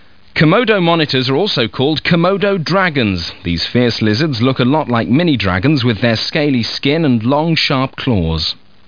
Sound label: jig